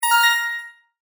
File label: Multimedia Chime, Technology, Multimedia, Chime; Chimes; BlastWave